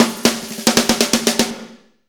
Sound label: Fast Shuff, Drumscapes Roland; Northstar